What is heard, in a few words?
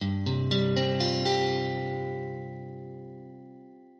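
Csus4, Guitar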